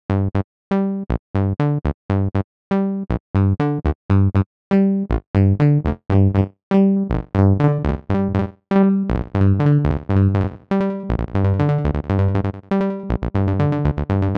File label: Roland TB-03 digital delay